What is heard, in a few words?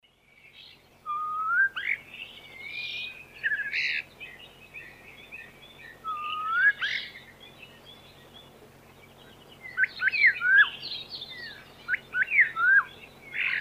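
starling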